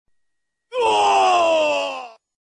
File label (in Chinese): 音效 大声喊叫